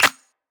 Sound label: clap; eddyflux; dough-samples